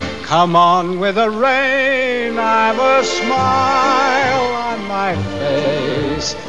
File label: come on rain